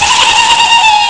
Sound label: hihihi; Amiga Soundtracker Sample Packs